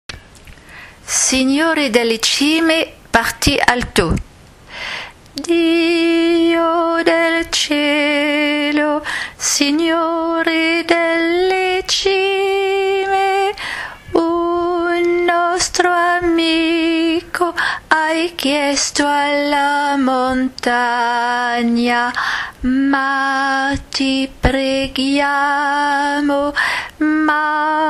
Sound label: alto